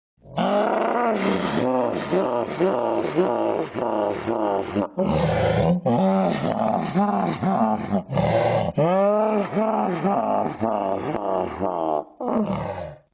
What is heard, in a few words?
laugh